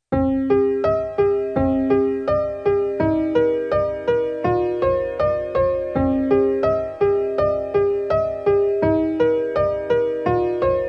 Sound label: jukebox song; server-nalc